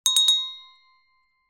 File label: BELL HAND